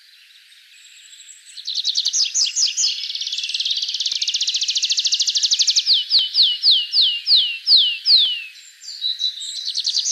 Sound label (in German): Baumpieper; Ornithologie